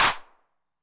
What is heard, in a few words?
HAND CLAP Reverb Pitch, 909; classic machines